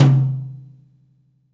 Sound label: Jazz Mid Tom, Roland BK-5